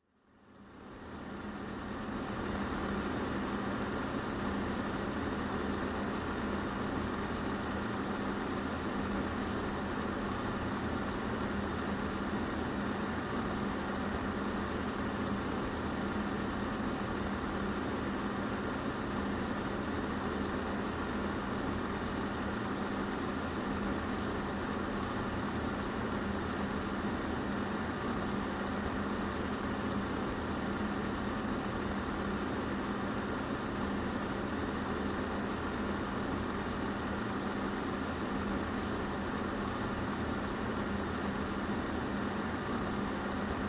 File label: PC Operating Noise Long